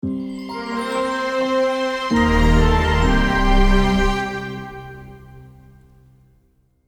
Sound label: auditory identity orchestral; canakkale